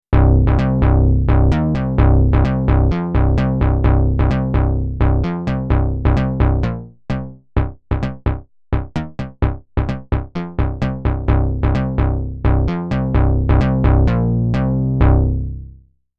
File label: 360 Systems Midi Bass Pro Decay